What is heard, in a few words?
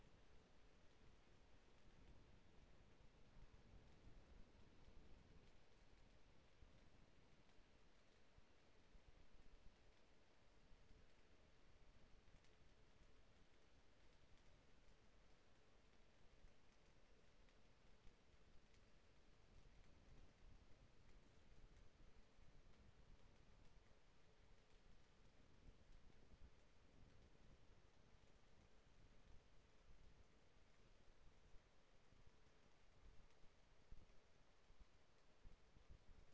HYDROPHONE 16000Hz; JASCO AMAR HYDROPHONE 740